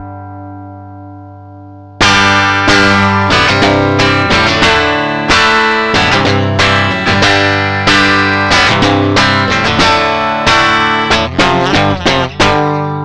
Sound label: tube amp 5AR4; low gain simulated output; Not exactly what the amp would actually sound like but close